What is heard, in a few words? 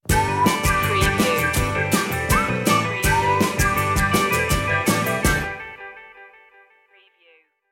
upbeatful stinger